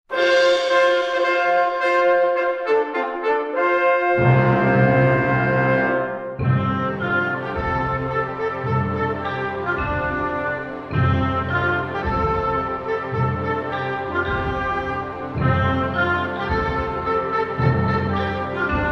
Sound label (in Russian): gimn centralnoafrikanskoj respubliki